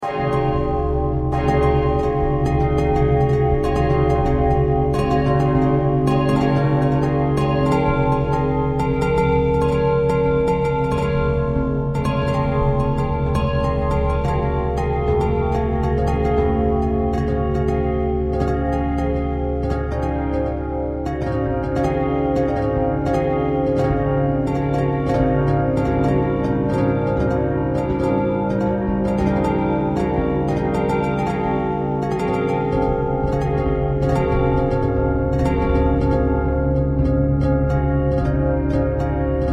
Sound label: bell bell thread pentatonic, bells